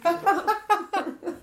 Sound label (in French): rire